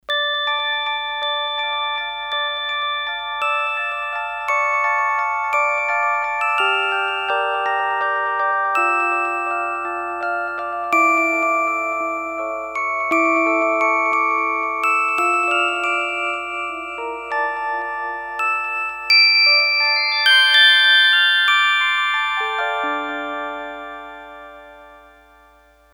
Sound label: Ultra Bell